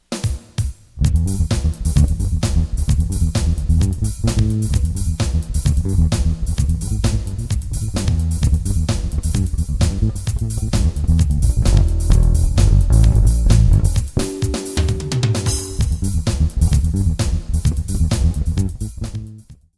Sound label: BASS